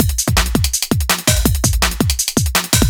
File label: dnb